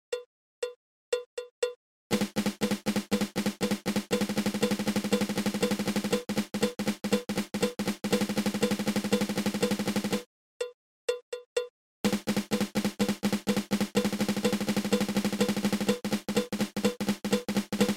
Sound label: Swiss Army Triplet